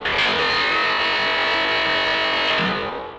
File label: WINGS